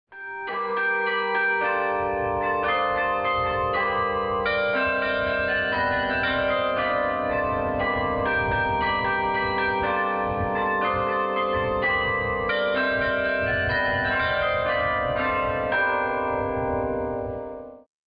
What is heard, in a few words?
Carillon